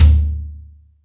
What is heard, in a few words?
Brsh Rk Fl, Acoustic Tom; Yamaha DTXpress